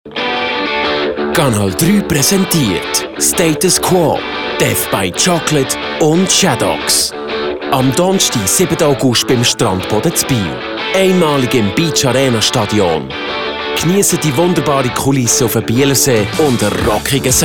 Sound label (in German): Trailer Beach Arena Stadion